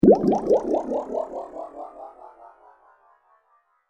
Warped Water Hyperspace

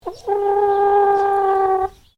Bird Chicken Highliner Brown Cluck Long; Birds from Collingwood Children's Farm; Animals

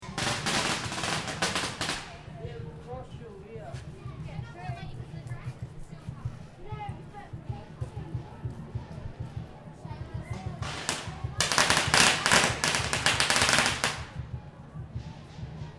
Ambience Bendigo Parade Dragon Fireworks; Bendigo Dragon Parade, Easter 2009

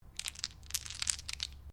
Dice Small Glass Table Pickup; Variety of dice sounds; Casinos

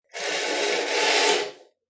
Slide Curtain; Window Sound Effects; Household

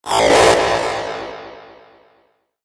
Distorted Laser Death Charge

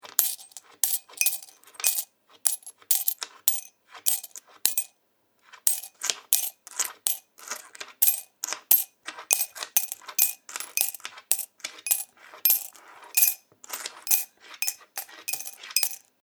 Sound of coins being counted with fingers; Counting Coins; Money; Objects

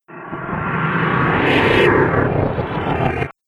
Alien Ambience Motion; Alien Sound Effects

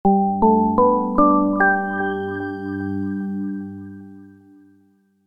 Startup, Computer: Short Musical Style Phrase

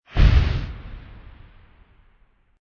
Fireball Left To Right, Burst of flame passing by; Explosions

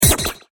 Alien Percussion Rifle Blast

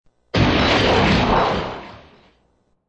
A loud crashing sound effect.; Crashes (Sound Effects:Objects)

